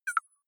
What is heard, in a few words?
click hard high